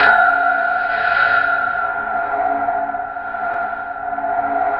tube; Optical Media International; Sonic Images Library; SI FX Vol 16

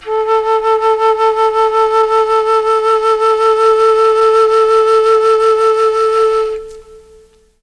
A2 CH FLT, Mellotron, CHMBLN FLUTE; Mike Pinder - Mellotron Archive CD

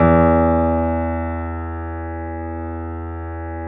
E 1, Keyboards Acoustic, GRANDP ST; USB Soundscan vol.17

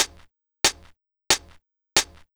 91 DRM SND, Pure Electro; USB Soundscan vol.03; AKAI

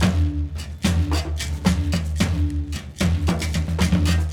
Bag Pipe Groove, Rattle, Brekete, Djembe; Berimbau

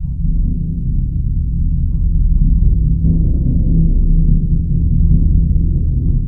BS DRM ROL; ILIO; Synclavier Percussion 2; World & Orchestral

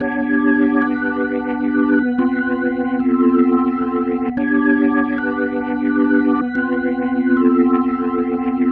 Psychedelic, Organ, Loops

